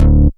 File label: Bass D, Offbeat Bass; Club; VEC1